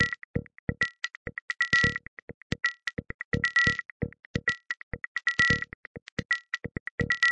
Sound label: 131 rollertechno